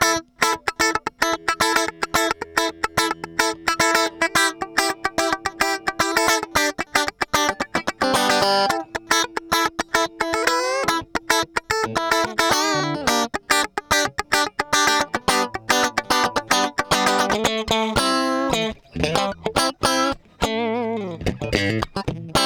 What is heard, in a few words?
funk direct out preamp; ada mp-1